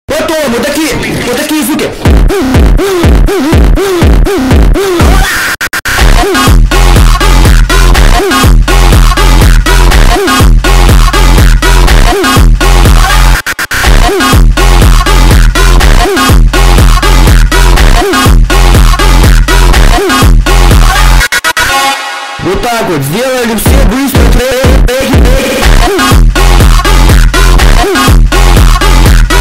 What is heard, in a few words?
funk